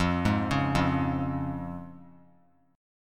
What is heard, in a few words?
F Minor Sharp 5th, chord; 17,15,16,15; Fm#5 arpeggiated; JGuitar